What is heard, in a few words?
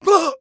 hit; Escape from Tarkov